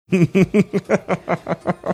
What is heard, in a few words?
chuckling